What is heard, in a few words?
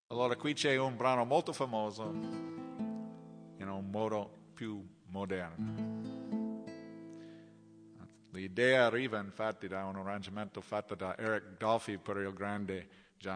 ac guitar greensleeves